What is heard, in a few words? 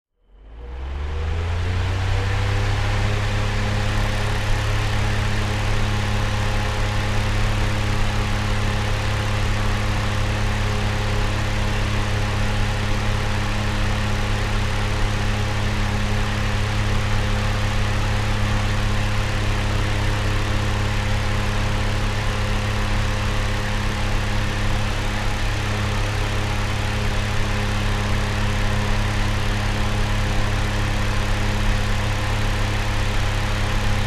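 Electric Fan Start Stop X2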